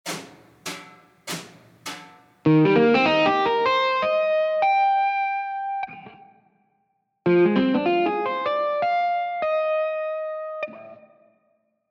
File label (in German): 16tel swing